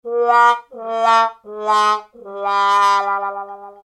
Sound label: sad trumpet